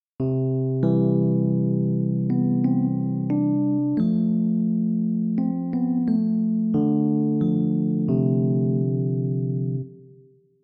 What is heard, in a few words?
rhodes; grainspace